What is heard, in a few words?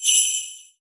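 sleigh bell, GM percussion kit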